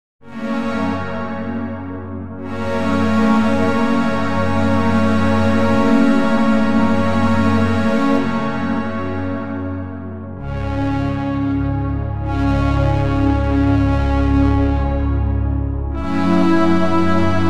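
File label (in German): sehr düsteres emotionales, pad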